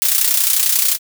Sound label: shuffle